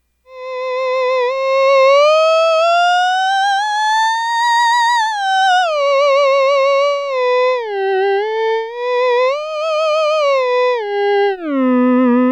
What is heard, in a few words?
reedy; theremin